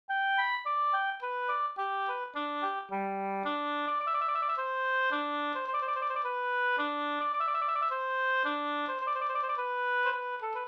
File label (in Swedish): slängpolska